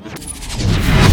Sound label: fx cinema